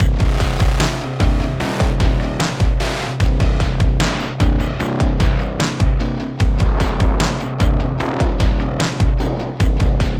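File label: Music Industrial Pre Race 75bpm; Forza Motorsport 6 Apex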